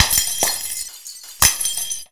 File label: GLASS